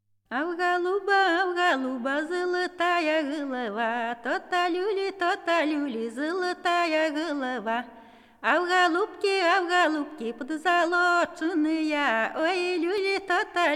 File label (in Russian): А у голуба золотая голова, Песни; Фольклорный фестиваль имени Ольги Сергеевой